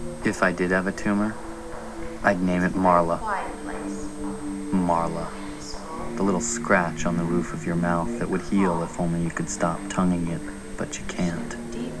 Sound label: scratch